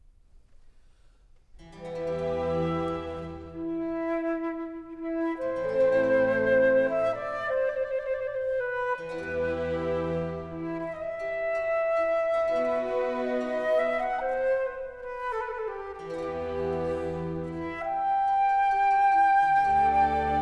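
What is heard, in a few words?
Concerto Grosso in G major; Op.3 No.3; Handel Masterworks; Klassika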